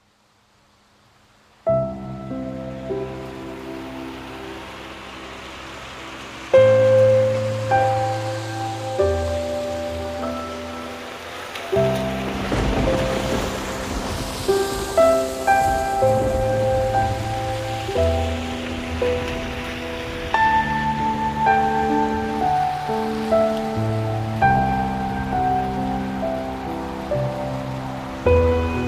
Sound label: Piano and Rain